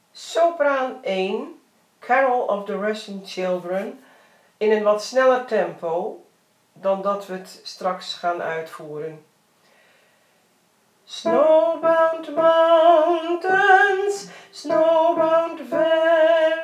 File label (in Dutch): sopraan